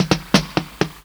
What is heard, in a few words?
Fill Drum, Ragga & Dub Reggae; USB Soundscan vol.27; AKAI